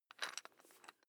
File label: electric drill bit fasten wrench turn very fast